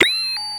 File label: user interface motion tracker pong; gmod; mw